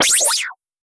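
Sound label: a short synthetic charging, charge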